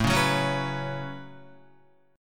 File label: A 6th Add 9th A down Guitar; A6add9 strummed